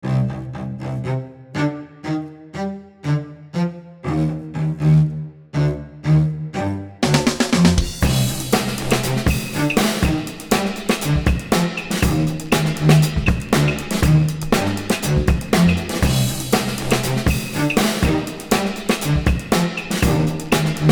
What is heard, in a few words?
Staccato String Sting